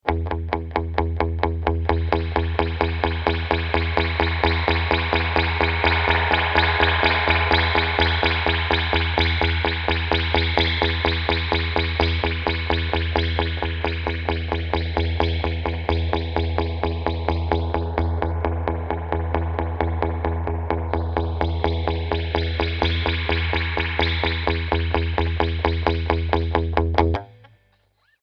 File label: Surf; Regler; reverbunits